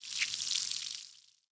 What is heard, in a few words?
body bloodspout; flinder